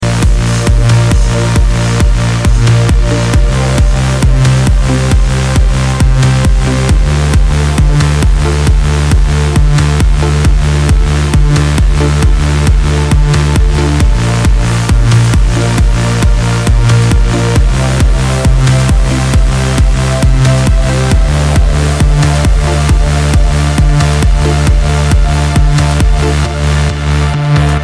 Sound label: trance